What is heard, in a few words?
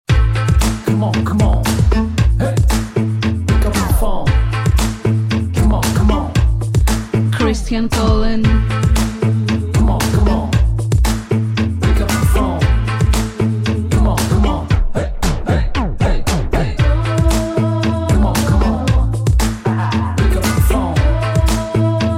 christian is calling rnb ringtone